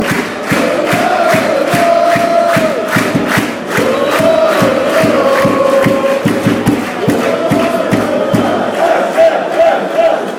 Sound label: fanchants; fc brug hes